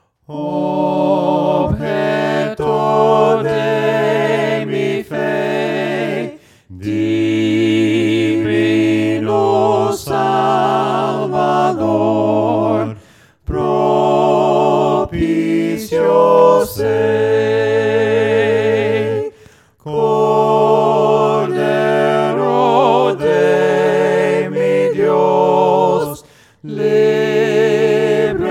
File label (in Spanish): VOCES, himnos a capella; cantos del camino; OBJETO DE MI FE